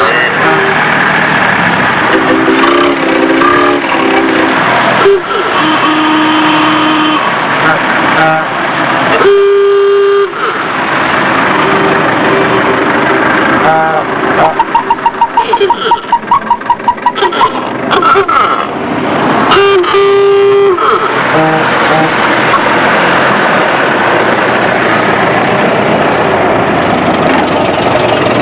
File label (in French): trafic, vehicles; Pinnacle